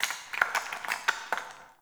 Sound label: Cave Ocean Rock Crumble; Ambience; Midrath Island